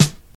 short steel snare drum sample D key, snare drum tuned to the D note; snare drum samples